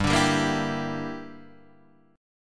G Minor 6th, Guitar Standard

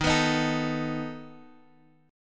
A Suspended 2nd Flat 5th D sharp, guitar

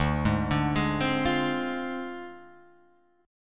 D Minor 7th Flat 5th; Dm7b5; arpeggiated

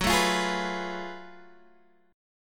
F sharp Minor Major 11th, Guitar, Half Step Down

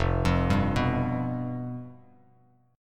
F Suspended 2nd Flat 5th, Bass Standard; arpeggiated; JGuitar

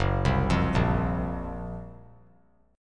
Gb Major 7th Flat 5th; GbM7b5 arpeggiated; JGuitar

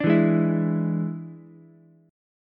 D Suspended 2nd Flat 5th D, Ukulele; 7,4,4,7; strummed

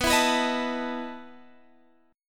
E Major 7th Suspended 4th, B

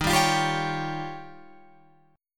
Eb 9th, Guitar, Open F; strummed

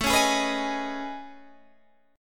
A Major Sharp 11th; AM#11 strummed

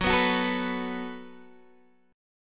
Gb Minor Double Flat 5th Gb Guitar DADGAD

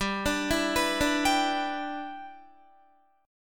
G 6th, Guitar; G6 arpeggiated; 15,17,14,16,14,14

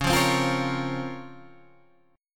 Db Major 13th, Guitar Open G

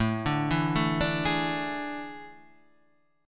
A Major 7th Suspended 4th

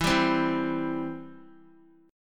E Minor Sharp 5th, Guitar, 4F,4A,5C,5G,5C,6E; Em#5 strummed

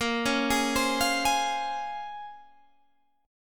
Bb Minor 9th Bb, Guitar, Standard; Bbm9; arpeggiated; 18,16,18,17,18,16; JGuitar

